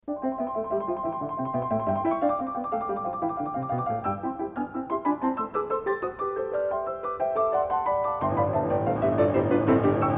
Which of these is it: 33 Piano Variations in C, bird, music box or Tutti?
33 Piano Variations in C